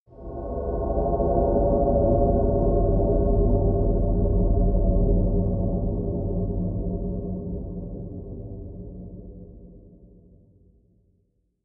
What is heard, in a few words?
air grey wind, horror